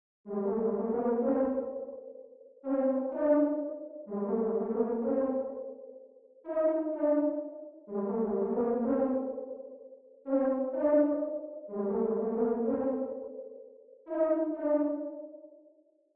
126 BPM G Min Lead; Melody; Loop Stems; Cymatics; 2022 Melody Collection Platinum Expansion; Go Faster

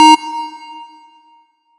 Riddim Synth Hit D#; One Shots; GameBro; Ghosthack; Riddim Essentials 2020

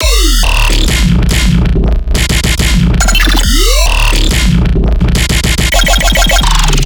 Riddim Bass Loop - X 140; Chaos; Ghosthack; Riddim Essentials Volume 2

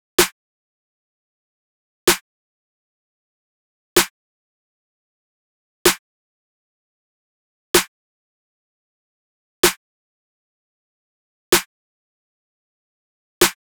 Drum Loop - 127 BPM Snare; Loop Stems; Cymatics; DOPE DRUMS VOL. 1; Grand Slam Drum Loop

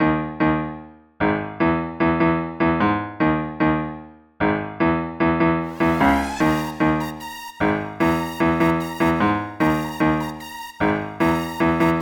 Melody Loop - 150 BPM D# Min, Hip Hop; Humble Sit Down Melody Loop; Cymatics - Humble Hip Hop Sample Pack

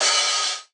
Open Hihat; Cymatics; Eternity Sample Pack; Drum One Shots; Cymbals

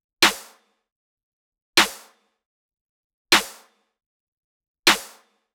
Hybrid Trap Kit 155bpm Snare; Drums; EDM Drums; Drum Kits; Stems; Ghosthack; Ultimate EDM Drums; Drum Mega Bundle; Hybrid Trap Kit 18

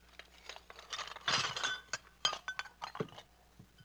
Pickup Dishes Tablecloth; Vintage Cartoon; Foley

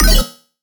Bass Cile, Dubstep; Bass One Shots; Ghosthack; Dubstep Sound Arsenal; 50 Bass One Shots

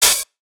Open Hihat, Trap Drums; One Shots; GhostHack; Drum Mega Bundle Demo; Achieve

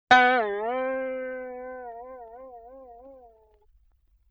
Boing Box High

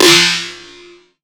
Snare - C Stretch; Drum One Shots; Snares; Cymatics; Light Free Sample Pack; Bonus Samples

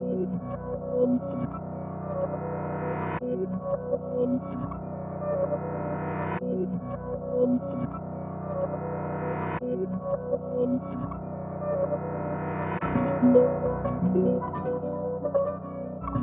150 BPM B Min, Reverse; Pushin

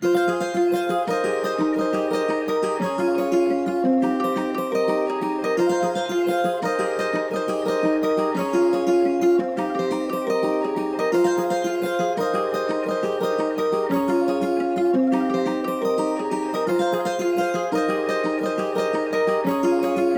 173 BPM F Min Guitar Bus, melody loop stem; Trap; Loop Stems; Empire

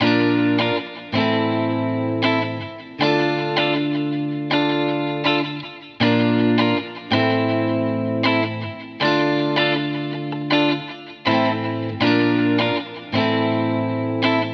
80 BPM D Min, Guitar; Summer; Cymatics; Miami Guitar Pack - Pt 2

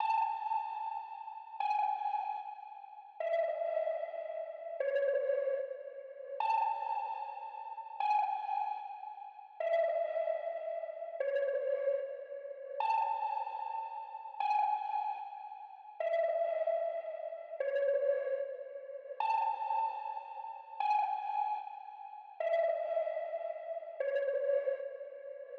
150 BPM F# Min Accents, Future Bass; Melodies; Loop Stems; Cymatics; GHOST (Beta Pack); Believer

